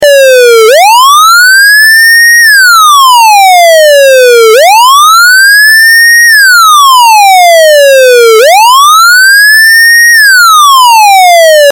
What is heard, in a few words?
WAIL